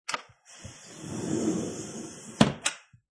Aluminium Window Slide And Close; Household

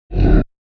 Wipe Low, Fast whoosh, called a wipe; Fast whoosh, called a wipe, for video editing, movements, logos, flash, or animation; Sound Design

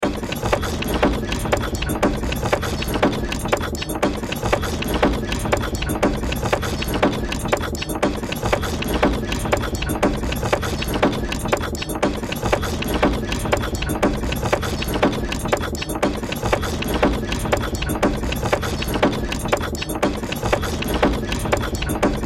Sci Fi Workshop Machines Mechanical Steampunk; Tech; Sci Fi Workshop Machines Mechanical Steampunk Metal Small Movement Inventor Clanks Clicks

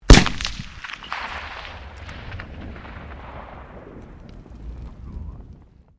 RIFLE 303 MK1 FIRING; Rifle 1942; Rifles, military; Guns

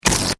Foley Balloon Expel Air Fast; Balloons; Cartoons

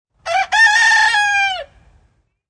Single rooster call.; Chickens

